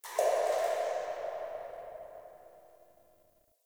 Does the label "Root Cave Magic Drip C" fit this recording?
Yes